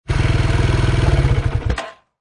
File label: Motorcycle Dirt Bike Shut Off; Motorbike type offroad Dirt Bike, Shut off and stop engine; Lynne Music